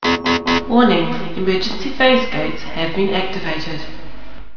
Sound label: vocal